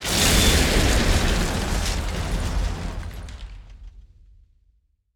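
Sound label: explosion material building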